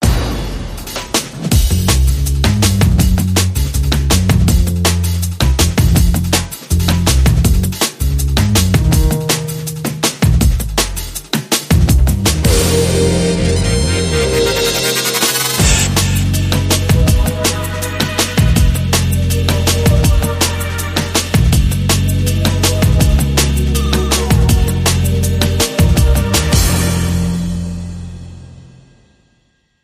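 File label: Sensitive Snare Short Rhythm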